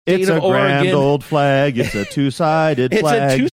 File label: singing it's a grand old flag it's a two sided flag